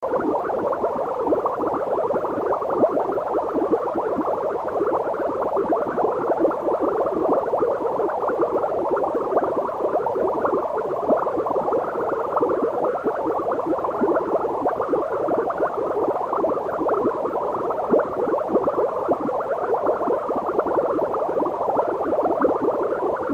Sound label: Doorbell Synthetic